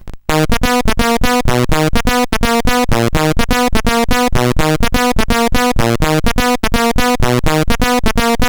Bass Sample, CSG8580R5

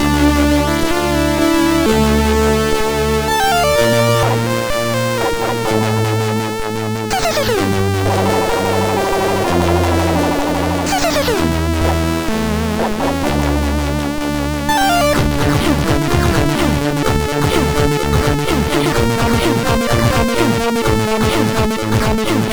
Outer Space, MOS6581R4

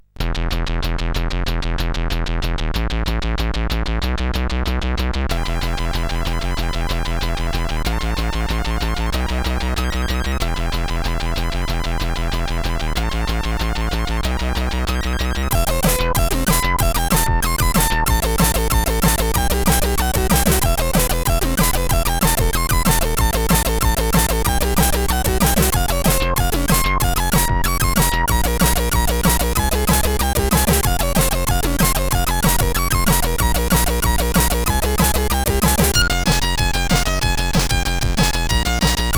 Loops, sid CSG8580R5

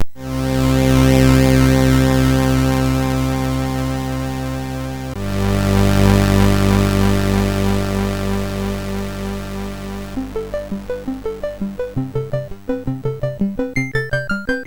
sid MOS6581R3

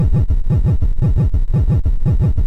SFX, MOS6581; Narco Police

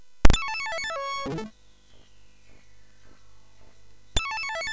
Sound Fx, MOS6581; hvsc

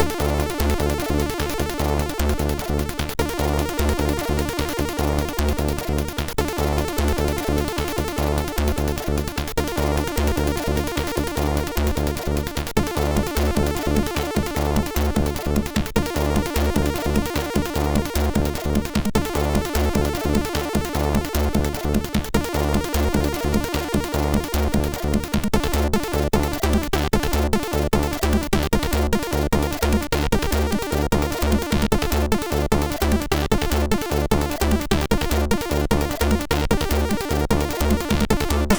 Somethin Like Techno sid MOS6581R3

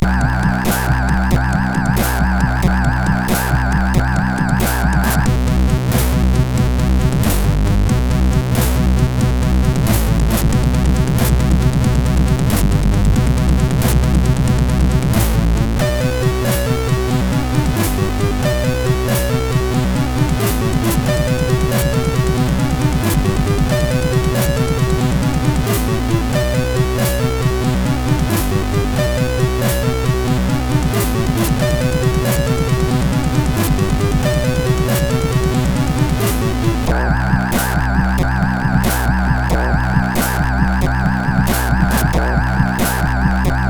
Disillusion, sid, CSG8580R5

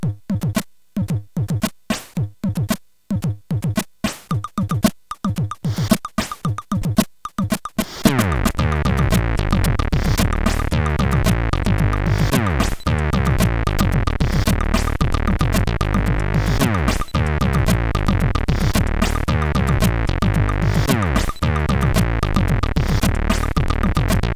Intro, sid CSG8580R5

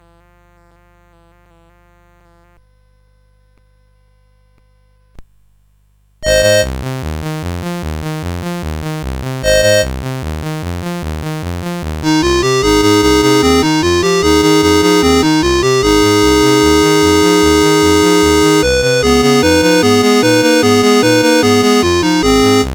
CSG 8580 R5

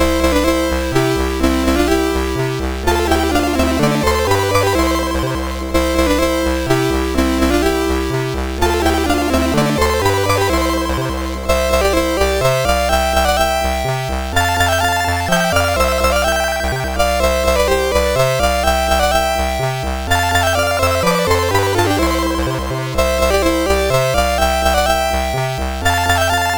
Gametune, MOS6581R3

